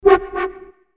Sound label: Misc FX